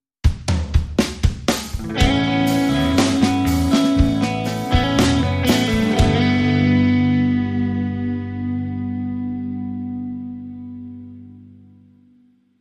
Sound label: Rock Dreamy